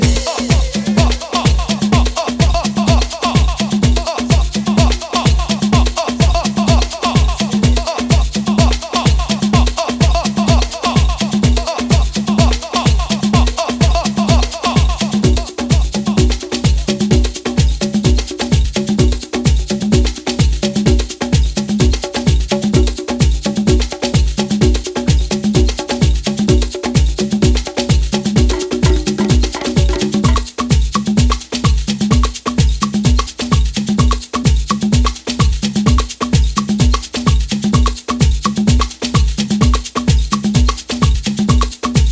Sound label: Drumminpella